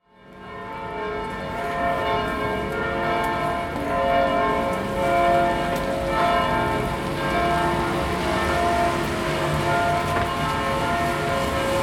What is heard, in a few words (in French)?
Budapest ambiance dans la rue cloches